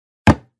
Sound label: Placing Object On Table Sound Effect